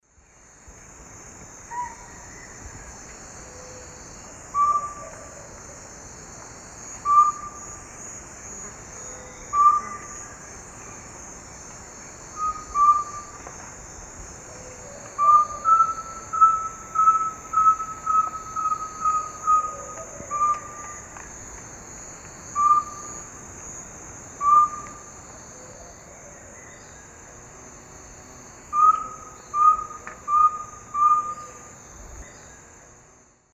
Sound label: Liosceles thoracicus, duet and song; S. America; Eastern Lowlands